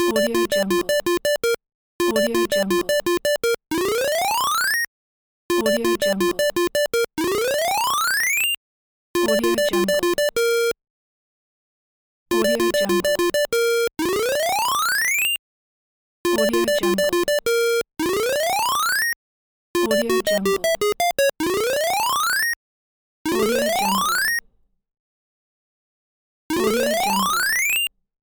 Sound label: 8bit winner tone; soundsground